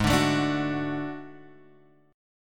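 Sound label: D Major, A flat; D/Ab; strummed; JGuitar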